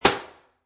Cabinet Door Close Wooden, Household, Cabinet Door Close, Wooden; Flimsy